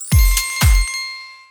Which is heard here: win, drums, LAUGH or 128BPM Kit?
win